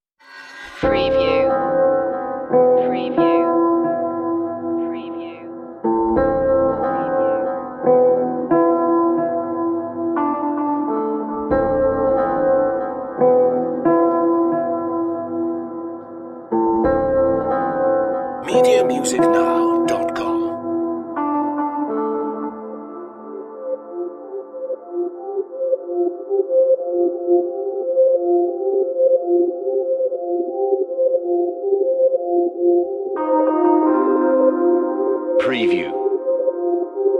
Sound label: solitary life no drums